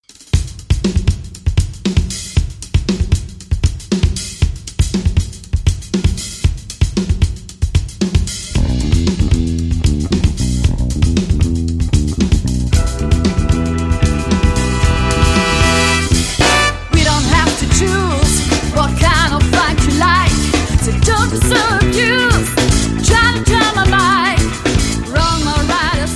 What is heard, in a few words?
funking attitude